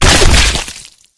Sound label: bodyfall combat form; flood